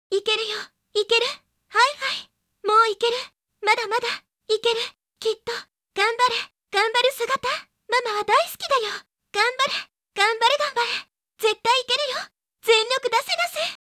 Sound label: cheer loop